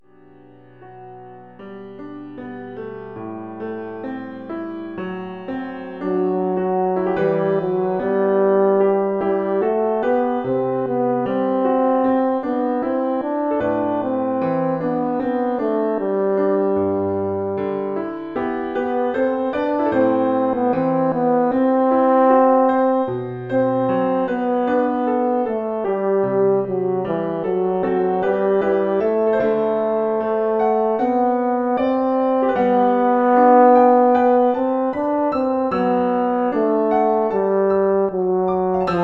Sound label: french horn solo + PA